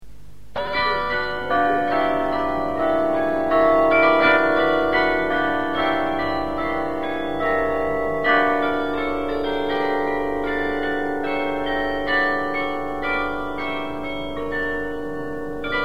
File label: carillon